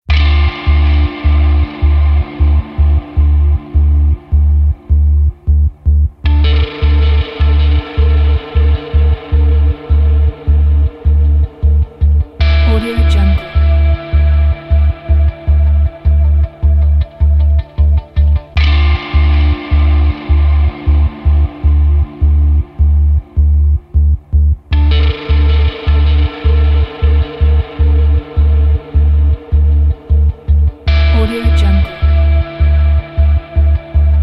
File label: menu